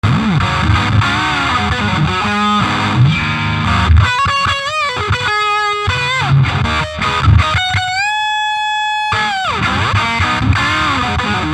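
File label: flying v, Electric Guitars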